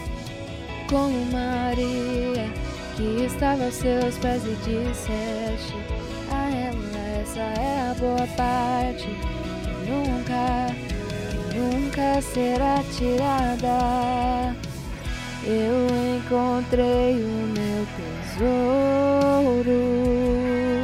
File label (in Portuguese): tenor